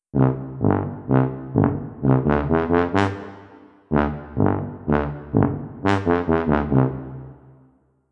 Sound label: tuba